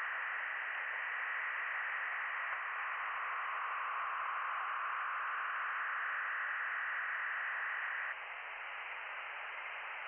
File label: from radio, 7177KHz